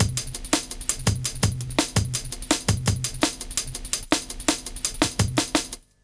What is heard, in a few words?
LOOP, Jungle; Explosive Jungle; USB Soundscan vol.05; AKAI